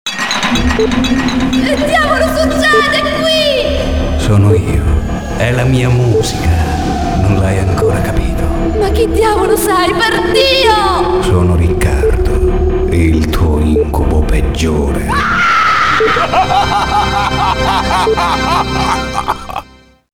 HORROR trailer

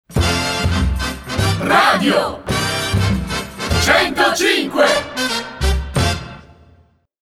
jingle; Jingle CIWAWA RADIO 105

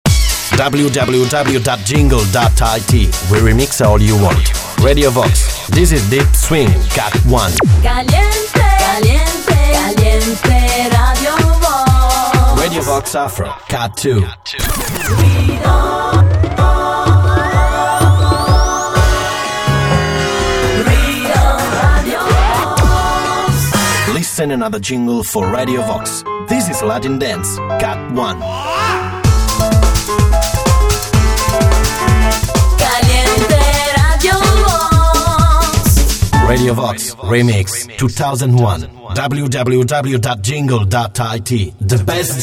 remix vox

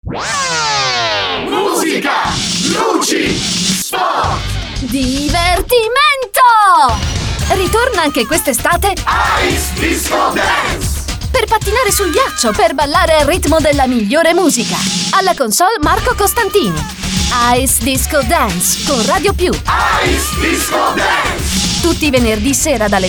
disco

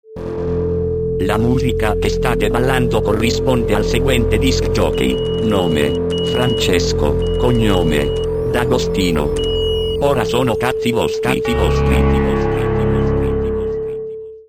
jingle

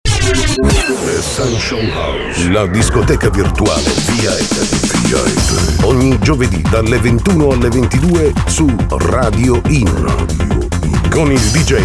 ESSENTIAL HOUSE PROMO RADIO

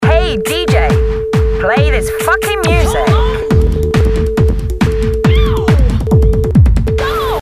tekno

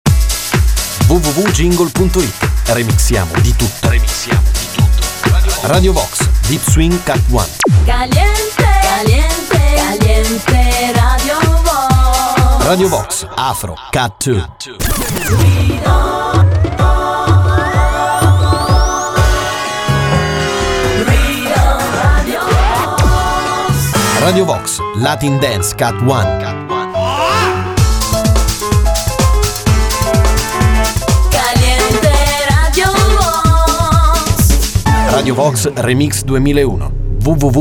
remix vox; Radio Vox Remix

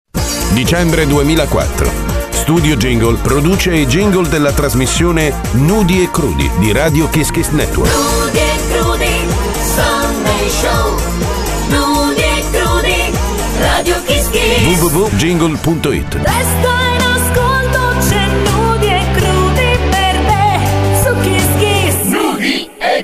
jingle; JINGLE trasmissione Nudi e crudi di Radio Kiss Kiss Network; ita

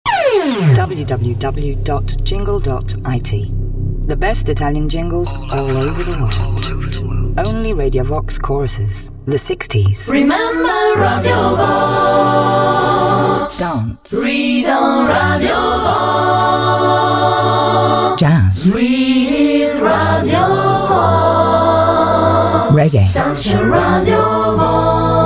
radiovox choruses; Radio Vox solo cori (english)

